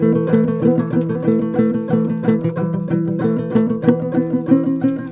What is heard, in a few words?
TOPSHUR; Soundscan vol.60; Asian Traditions; AKAI